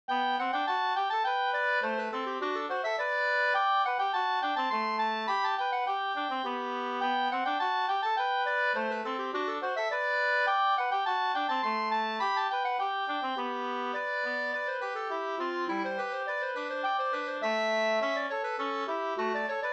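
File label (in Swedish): slangpolska, two-part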